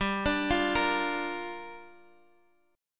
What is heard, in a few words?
G Minor 7th